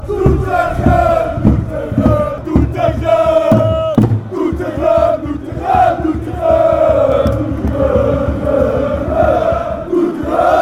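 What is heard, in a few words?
fanchants, football chants; doetinchem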